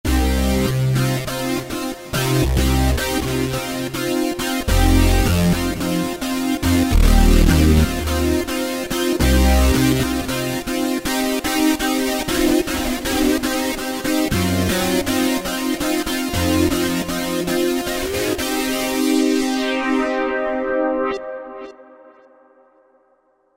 Roland V-Synth Wave Ripper; Factory Patches

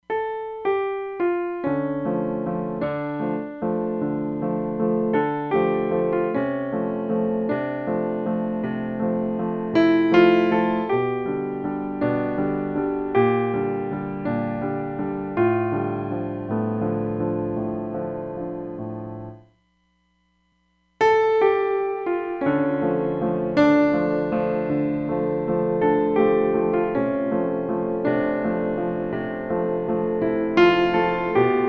Piano; Roland DEP-5; Factory Patches

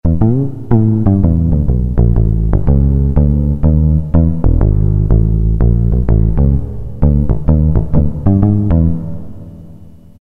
Acou Bass, Roland MT-32

